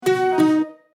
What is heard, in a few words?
Flute; Aio Wireless